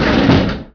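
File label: door close; Deep Fighter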